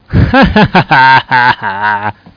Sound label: ha ha ha; rottdemo